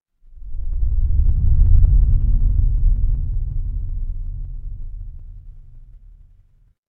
horror wind whisper

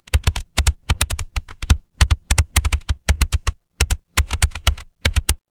office keyboard

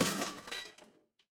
impact crash

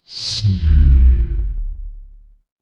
transition sweep

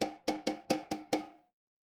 glass glass tap

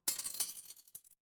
glass shatter

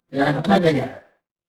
ui modal

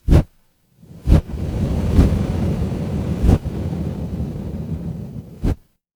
fire electric whoosh flame

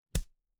impact punch

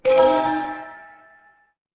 feedback achievement

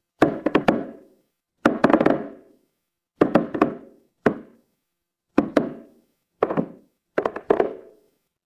horror creak

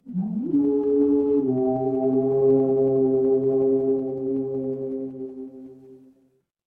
crowd cheer

